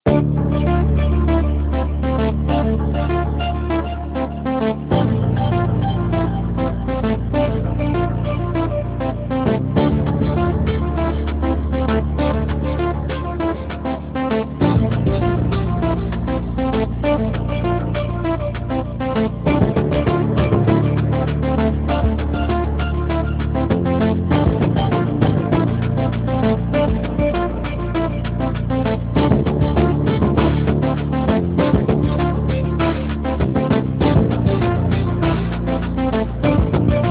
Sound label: ringtone newage